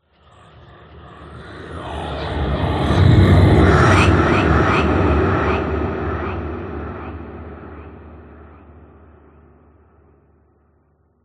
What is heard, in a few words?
GHOST WHOOSH; PMSFX